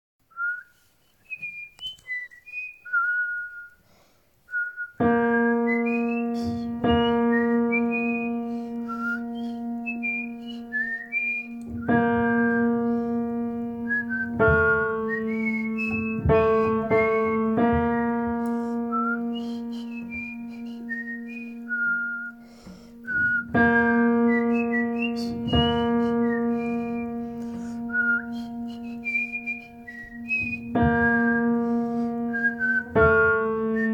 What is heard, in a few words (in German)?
Jutz Tenor; 2. Tenor